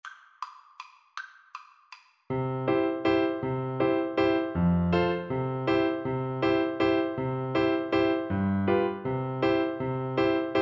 French Horn, Bavarian zwiefacher

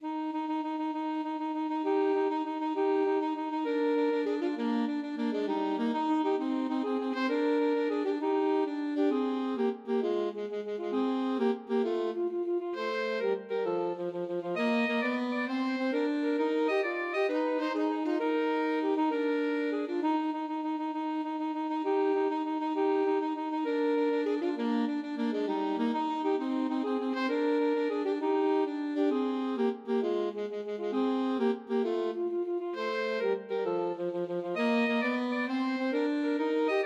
alto saxophone duet

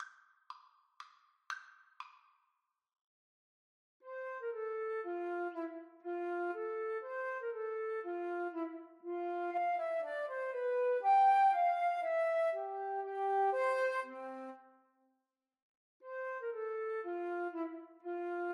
minuet, flute duet

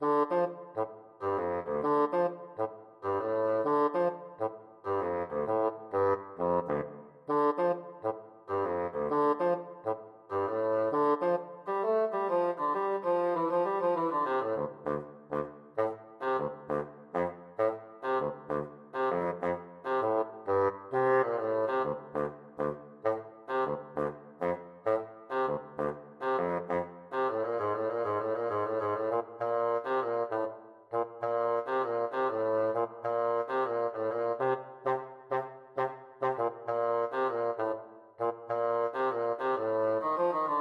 Funk Study, bassoon

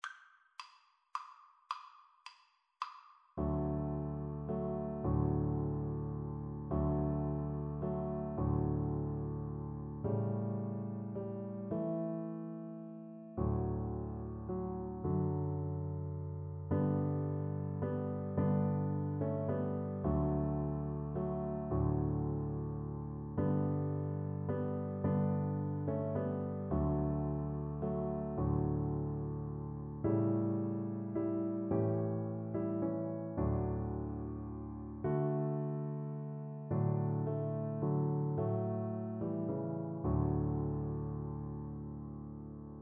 silent night, Viola

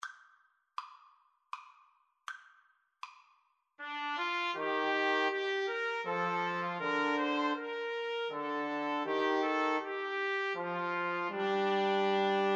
2 Trumpets Trombone

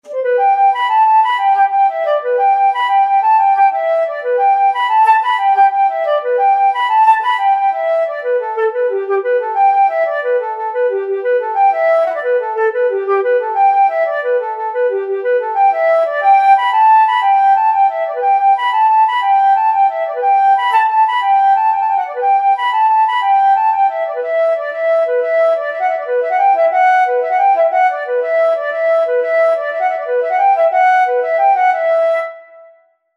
flute

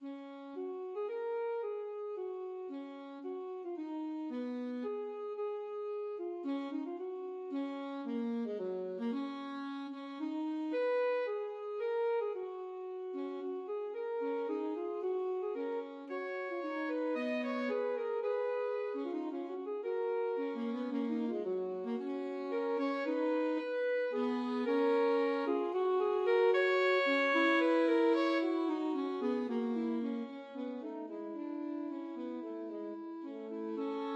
Alto Saxophone duet

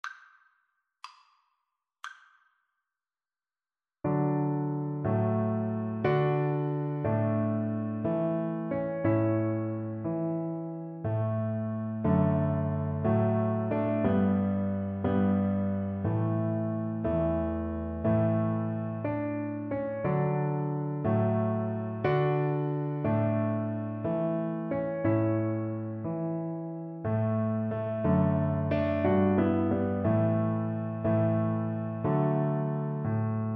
verdi nabucco viola

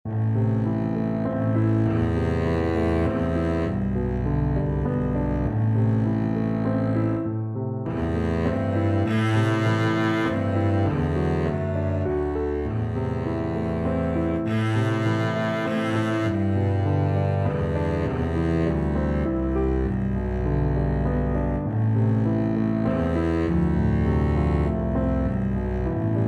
scarborough fair double bass

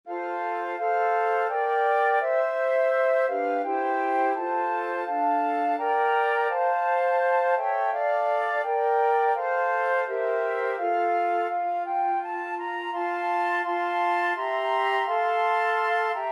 god save the queen, flute quartet

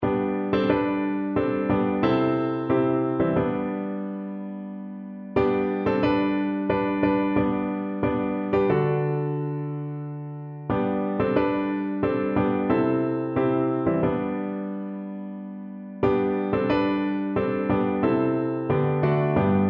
piano

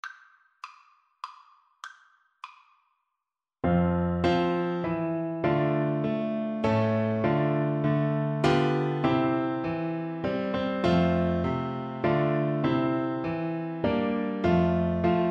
star spangled banner, Bassoon

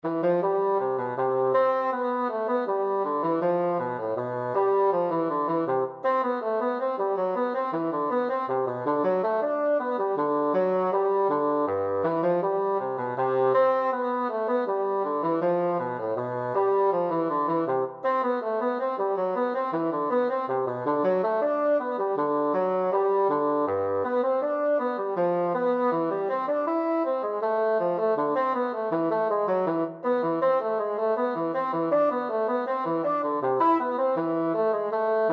bourree, bassoon

